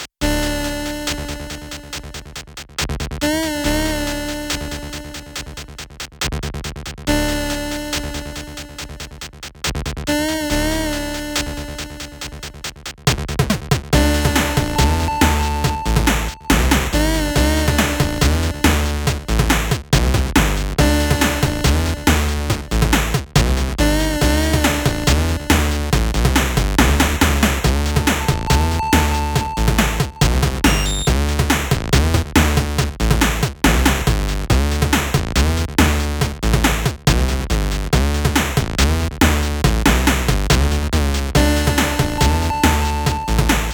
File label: 8bit Ver.; Hell on Bare Feet; travis strikes again no more heroes; nintendo switch